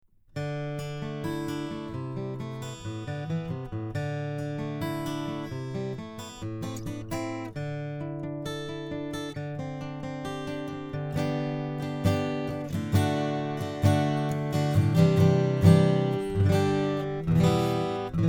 Bone plastic pins, acoustic guitar

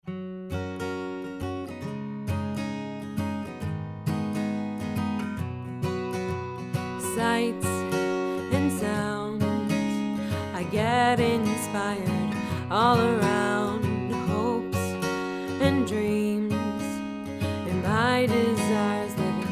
Female Mix; Manley mic; manley mic comparison gold silver refc

